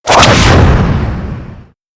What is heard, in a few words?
spin whack